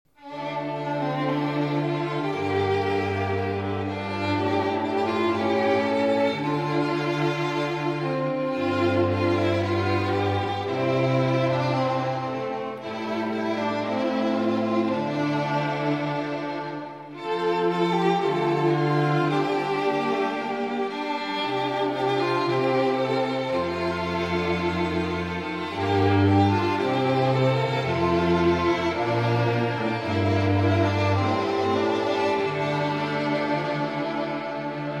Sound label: String Quartet; Jewish Wedding Processional; J. Hadar